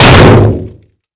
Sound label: Projectile Sounds; Red Orchestra Ostfront 41-45